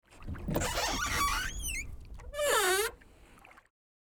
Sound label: Boat interior door opening or closing